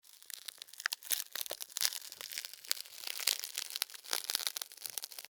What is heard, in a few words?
Extermination, Fly, Paper, Movement, Sticky, Insect, Bug; Sound Effects:Animals:Insects; Blastwave